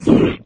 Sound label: melee, flood